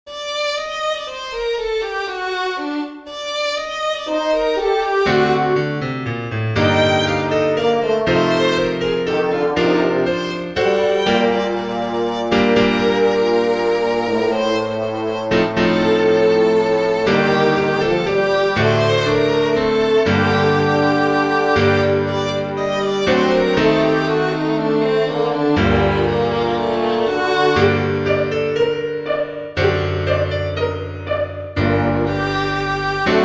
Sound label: Trio for Violin French Horn and Piano